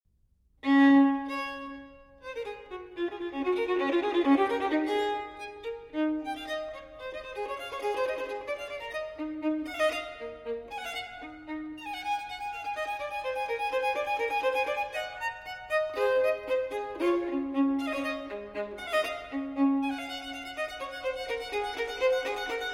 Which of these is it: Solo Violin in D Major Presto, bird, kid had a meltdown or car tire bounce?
Solo Violin in D Major Presto